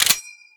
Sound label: shotgun cock back